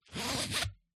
Backpack, Straps, Adjust; PMSFX

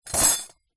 household cutlery metal in plastic tub bash

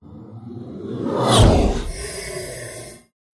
whoosh; Audio Mangler

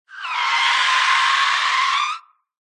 tire skids and screeches tire emergency brake; silverplatter audio

